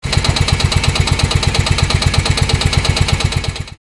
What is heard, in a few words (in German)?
Generator